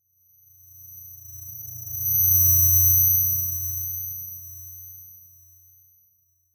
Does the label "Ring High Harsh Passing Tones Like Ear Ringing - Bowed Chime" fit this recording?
Yes